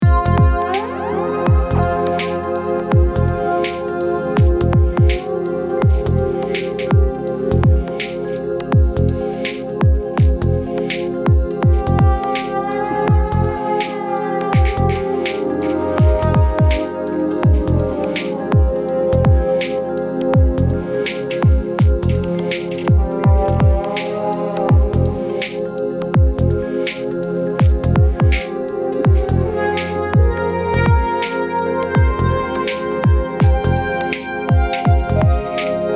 Warm Vibes Attack 10 Release 2 20-1 SHPF 180Hz Mix 100